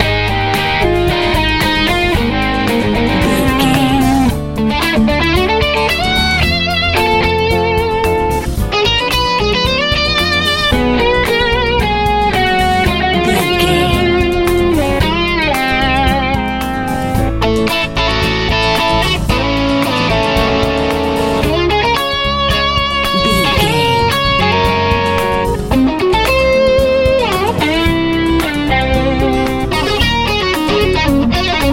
easy pop

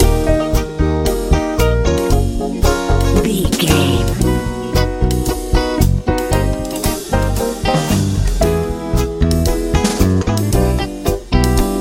hopeful warm soul

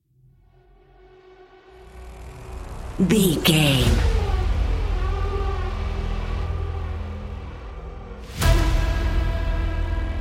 industrial action trailer

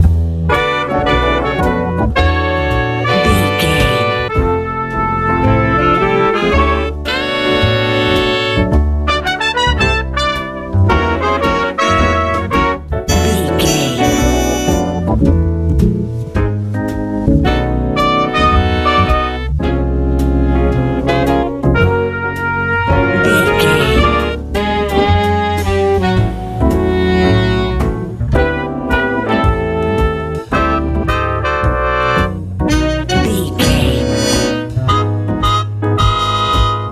warm blooming easy jazz

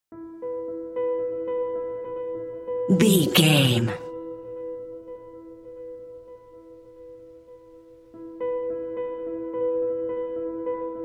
dark desolation piano only

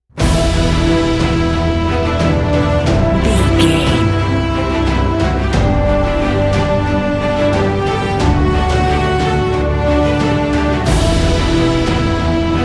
cinematic corporate

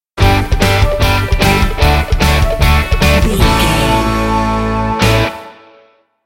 stinger

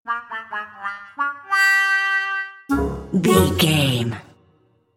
cartoon

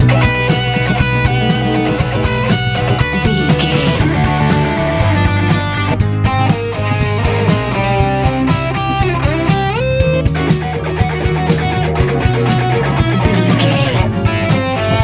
easy rock

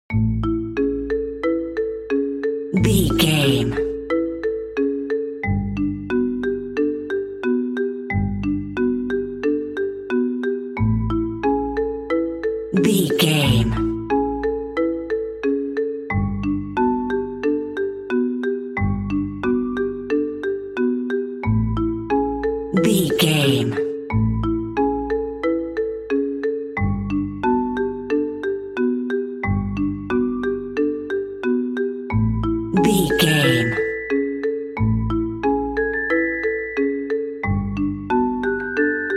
marimba bass and celesta

